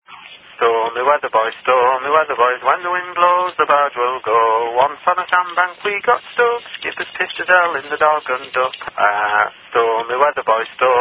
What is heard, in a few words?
Stormy Weather Boys [Rude Verses]; horntip collection